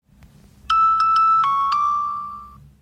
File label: payment tune; paytm